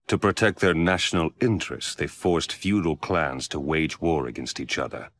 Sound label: pain